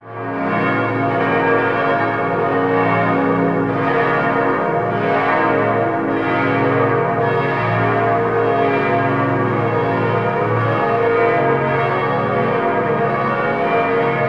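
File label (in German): Weihnachtsglöckchen, Glöckchen klingeln sound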